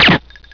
shot drop

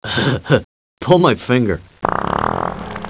Fart

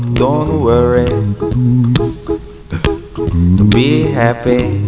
be happy

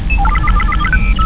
unbeep

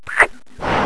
KISS BOMB

